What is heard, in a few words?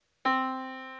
yamaha Disk